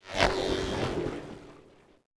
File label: player Stunned; Feeding Frenzy